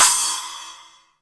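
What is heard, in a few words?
SPLASH, cymbal; THE GENERAL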